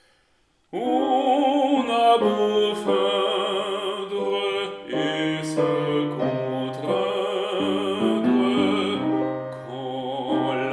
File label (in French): Basse; Lambert; On a beau feindre